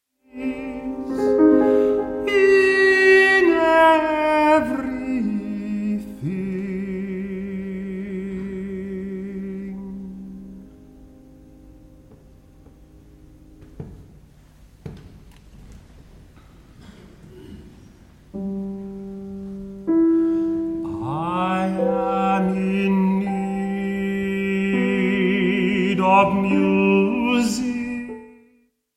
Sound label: sonnets for voice and piano; Huw Watkins; four sonnets